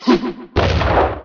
jump special; Motumbo

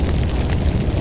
fire loop; faustus

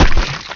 Enemy Explode

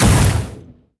heroes basic Attack impact; kosmyr

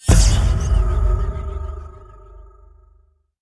high mage time cast

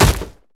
flag fall

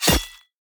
dragons enemy lava evolved skyfall; sfx

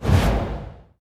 ui rewards disappear

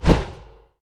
boulder throw; stone druid

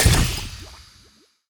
skunk bombardier death

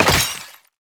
spiders enemy spider death; glarenwarden

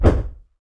tree coco throw

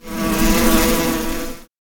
wasp

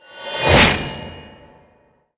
double slash gore; bolverk

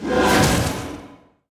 portal close; sfx; riff Portal

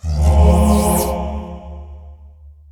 priest teleport

